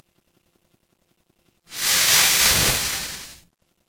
transition swoosh

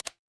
ui toggle

